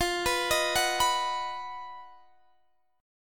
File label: B Major F